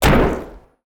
body impact wood

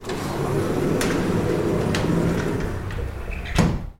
elevator door close

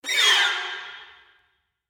short gliss down

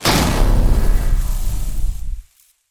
electricity impact

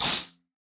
snake attack; soundscrate

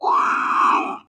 dinosaur grunt

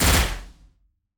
Assault Rifle; SoundsCrate

